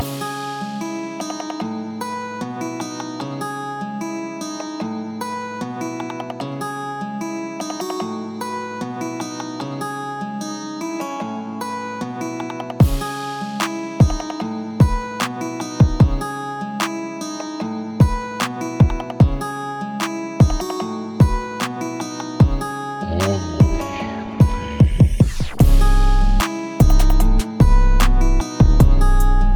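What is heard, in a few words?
only harp beats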